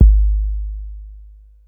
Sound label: KICK, Hip Hop; PlatinumPhattCD1; E-MU Producer Series Vol. 8 – Platinum Phatt